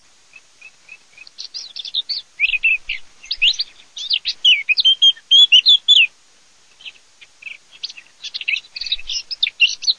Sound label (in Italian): CAPINERA